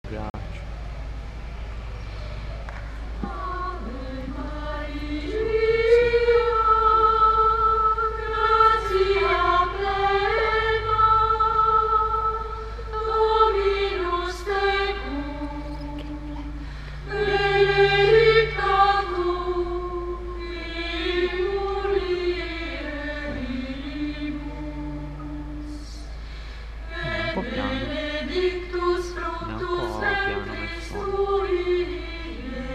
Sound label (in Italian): coro Ave Maria gregoriana; J.S.Bach